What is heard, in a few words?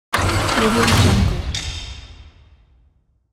transforming robot